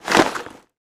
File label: Short foley